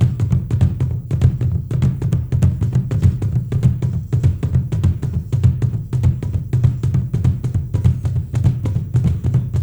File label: ZULUS, ZULU DRUM; Spectrasonics; Heart of Africa 2